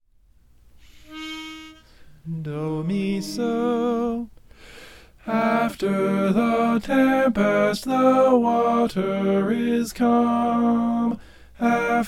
tenor

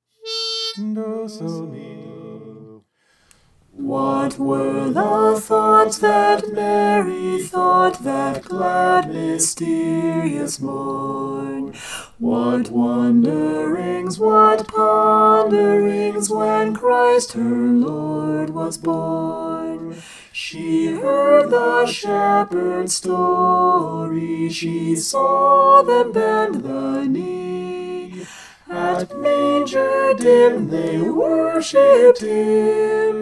soprano

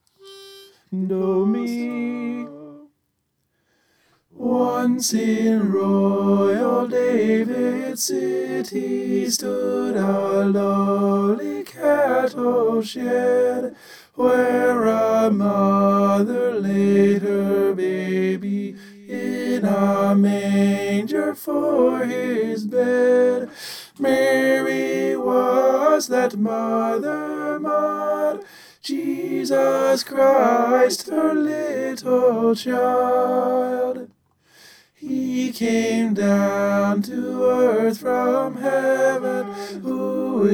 tenor

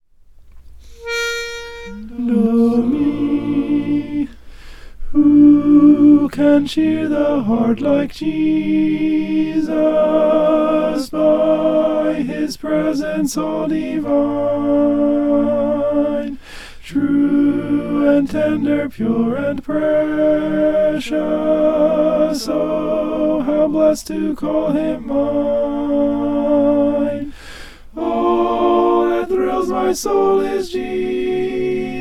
alto

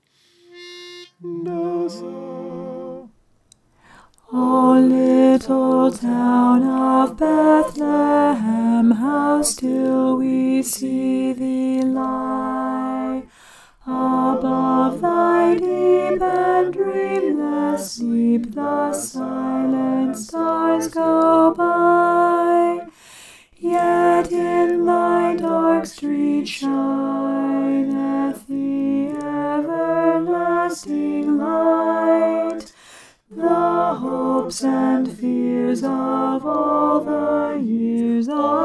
o little town alto

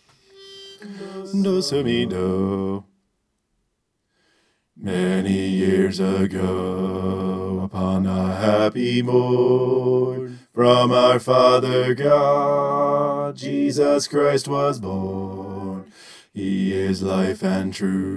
bass

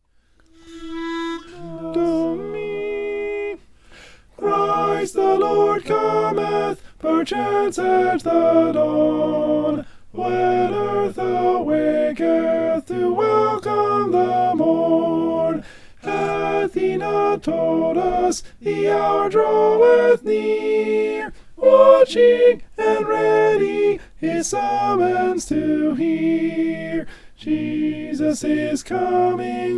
alto; christ the lord cometh

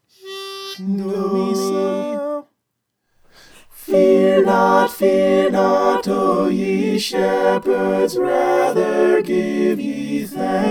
mixed

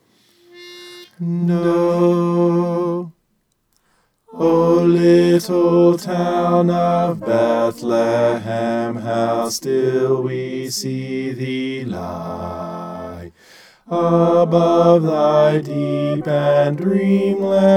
bass

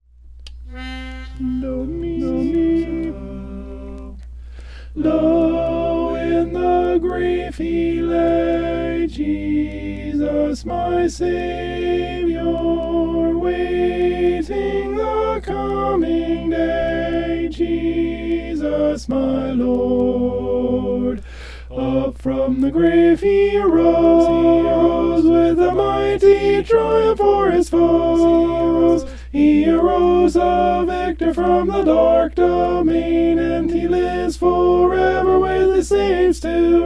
alto

